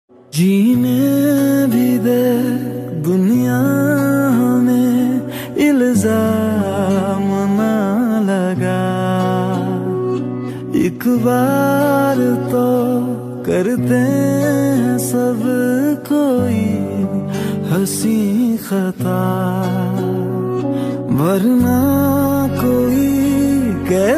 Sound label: Hindi Romantic Song Ringtone; ringtones